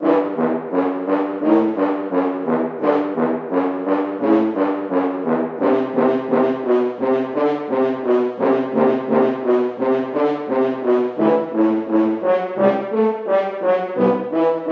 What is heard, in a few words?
Horn; Sanctuary Score; The Bard's Tale Remastered Trilogy